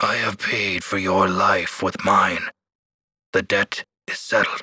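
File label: female dead; NWNDS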